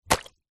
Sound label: swimming cap latex drop water; zapsplat